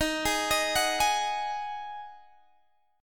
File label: Eb Minor Double Flat 5th, down, Guitar, DADGAD